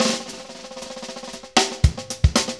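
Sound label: 118 bpm, Funky Drums; AMG; Now CD-ROM (Roland); Neil Conti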